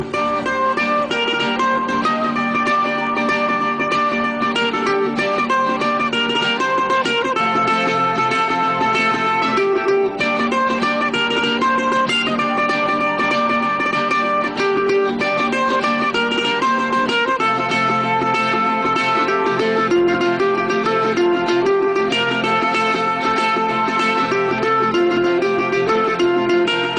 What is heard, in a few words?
Guitar; Ddlj